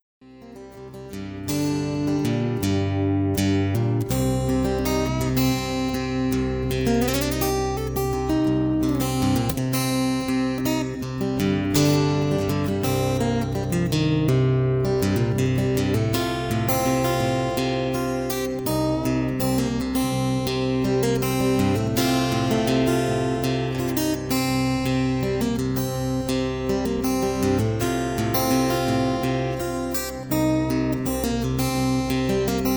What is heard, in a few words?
Blues